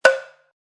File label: Cowbell Sound